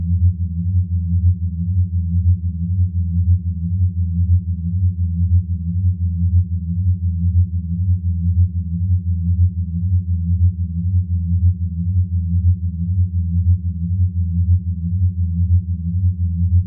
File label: Orca; Inquisitor 3